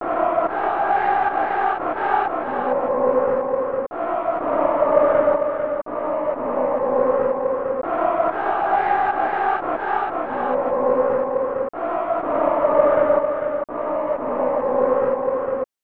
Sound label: Crowd Chanting; snes; Kick Off 3